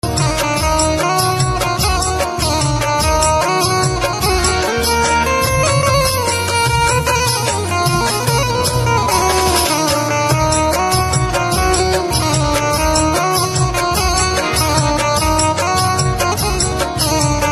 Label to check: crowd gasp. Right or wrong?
Wrong